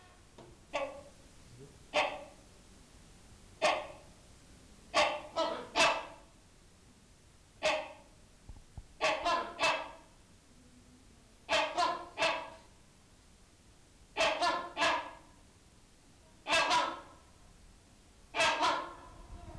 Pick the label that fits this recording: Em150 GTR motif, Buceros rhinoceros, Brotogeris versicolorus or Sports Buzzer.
Buceros rhinoceros